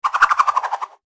spin

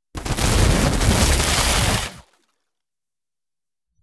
Digital glitch

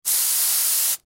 Graffiti spray can

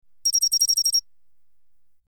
UI beeps

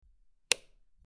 Light switch click

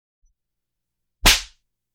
Smack

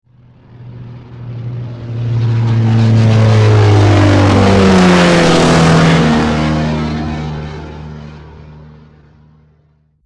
Airplane takeoff

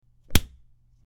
Punch

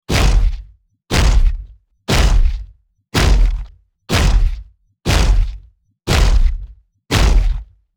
Robot footsteps

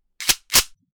Reloading a gun

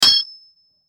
Metal clank; Sound FX

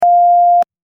beep

